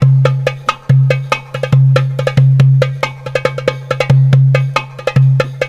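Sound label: DARB, Oriental; Best Service; Ethnic Journey Sound Cube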